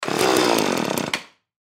Chainsaw 35 Cc 18 Inch Pull String Putter Stall No Start; Pull String Start Up; Industry; Saws; Blastwave